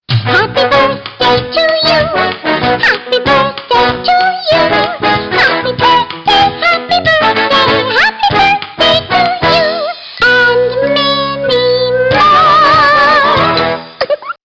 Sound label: SATB